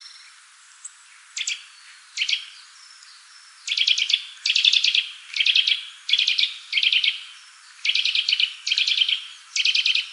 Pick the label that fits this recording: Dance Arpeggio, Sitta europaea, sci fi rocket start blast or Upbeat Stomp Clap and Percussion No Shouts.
Sitta europaea